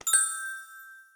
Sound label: item pickup